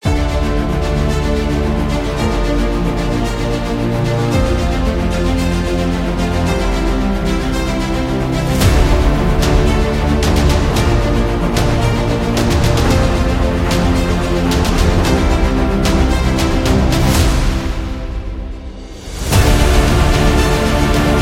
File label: The Epic Trailer